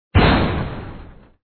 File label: Bomb Explode; Blox Forever